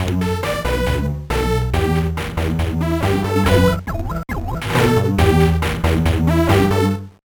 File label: Bathroom Jam